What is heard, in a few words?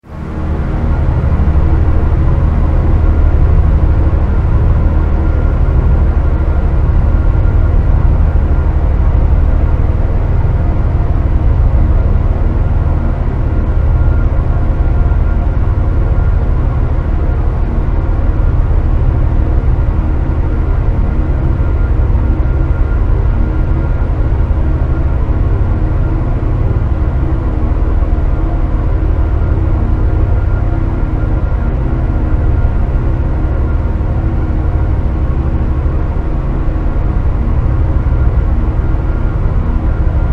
Drone Synth Strings, Drone, Synth, Strings, Stab, Long, Horror; 5.1; BlastWave